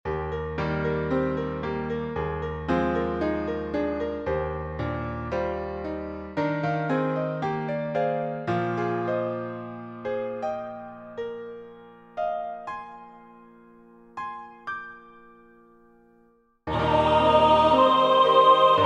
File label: Alto